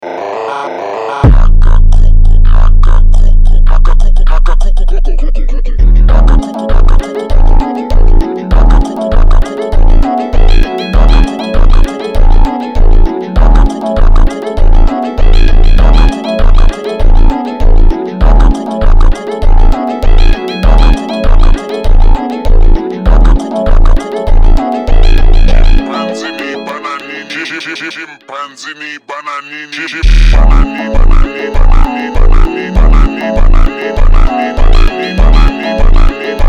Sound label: funk super slowed